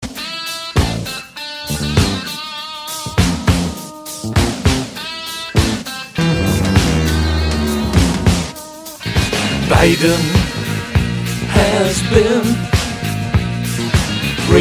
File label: breaking wind